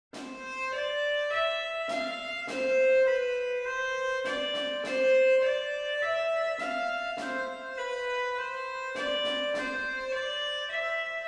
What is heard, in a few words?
perc sync